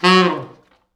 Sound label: T Sax falls, Horns Sax